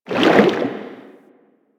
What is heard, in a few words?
Shark Tail Move Swim; Ocean Shark